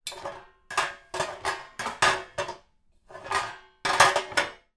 household pans metal place stack on top of each other